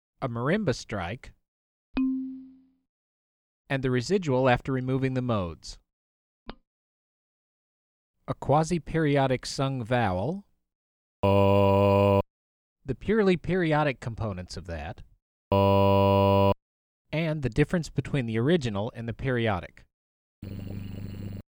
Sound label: Deterministic and Residuals